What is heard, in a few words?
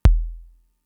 Kick, Drum Machines Fricke MFB-522